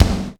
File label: AMB K, HALL KITS; Crypton - DrumBase5000